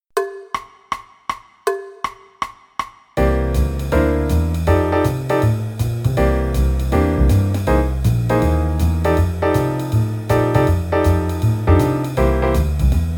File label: Blues in C qn=160; Play-along; 4 choruses; Jazz Piano